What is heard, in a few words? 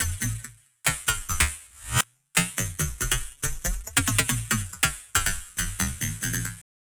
Structured Rhythm, IDM Rhythms